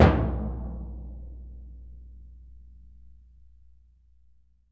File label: TMP C#, Synclavier Percussion